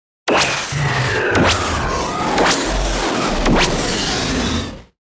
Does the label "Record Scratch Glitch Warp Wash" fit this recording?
Yes